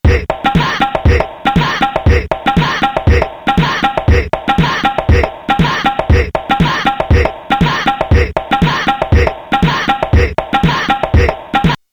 Pocket Operator PO-133; Teenage Engineering

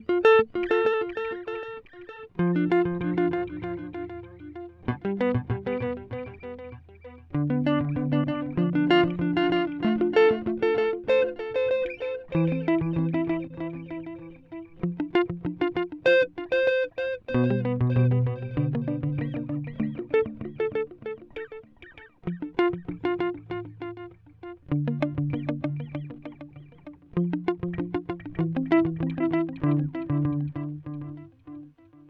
Starlight Echo Station Tape A

